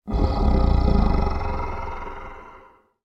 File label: horror monster ogre growl